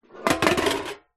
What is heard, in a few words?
household tin metal empty drop down on wood; zapsplat